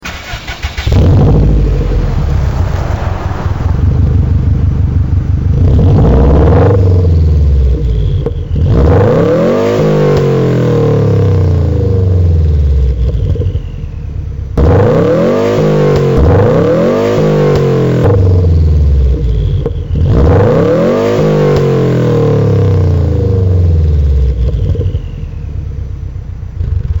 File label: Subaru Exhaust